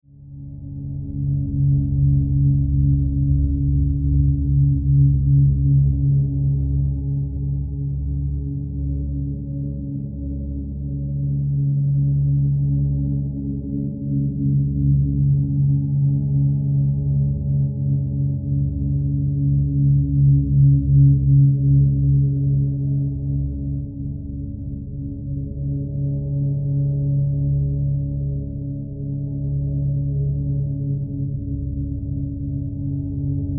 Drone Mysterious Hum Low Resonance; Sci Fi; Blastwave

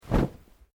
Clothing Kimono Sleeve Flap; Movemement sounds for various articles of clothing; Dressing; Human

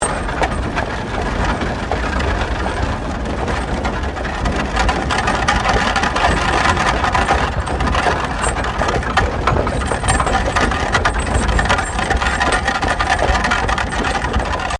Wagon Horsedrawn 4 Horse Medium Wheels Loop

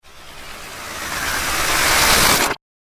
Warped Water Falling; Water Sound Effects

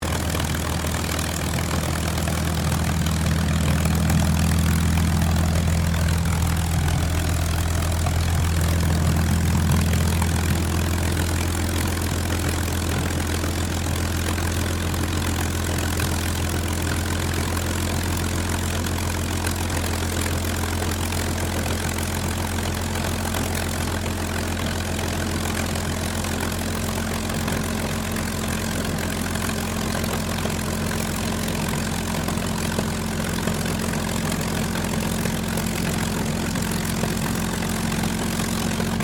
AIRCRAFT CAC CA-18 MUSTANG MK23 IDLE; CA-18 /P-51 Mustang; Airplanes; Vehicles; Aircraft Collection; Twistedtracks

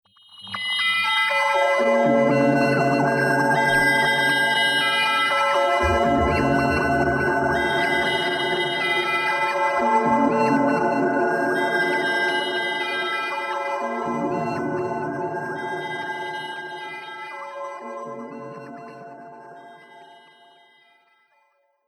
Haunting World Drone, Drone Sound Effects; Sound Design

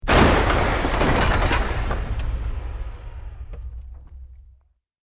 EXPLOSION MEDIUM WOOD

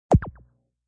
Lazer Sound

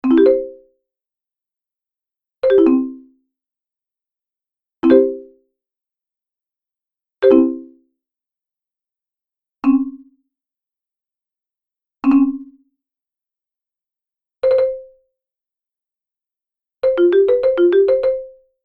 Menu Sounds Collection, tech sound effects; sound design

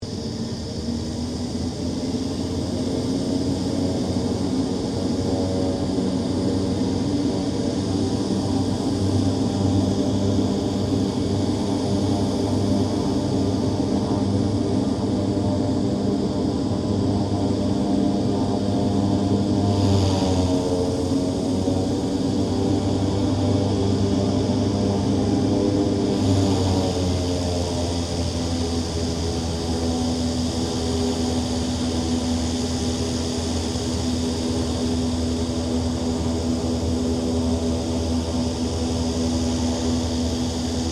King Air 350 preflight, stereo; Airplanes; Vehicles

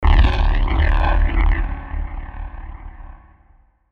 static pulse; Static Pulse Hit Delay Swells; Production Element Imaging Element Accent Transition; Blastwave; Static Sound Effects

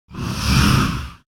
Organic Engine, spacecraft; Spacecraft Sound Effects; science fiction sound effect

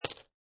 Poker Chips Dropping On Poker Chips

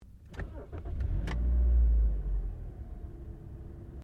CAR TOYOTA COROLLA IGNITION INTERIOR, hatchback; 2009 Toyota Corolla; Cars (Sound Effects:Vehicles)

